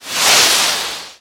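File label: stone-processing machine working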